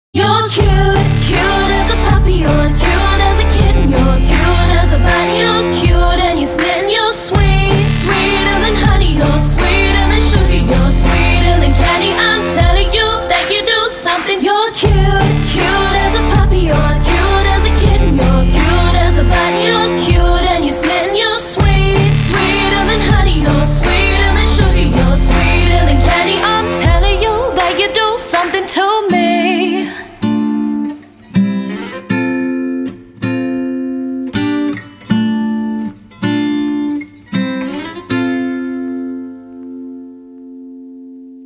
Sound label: Cat Fail Reenactment music